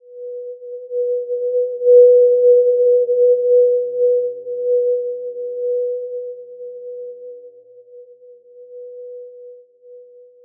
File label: Simple Glow B4 p; HSS-Custom-1; healing soundscapes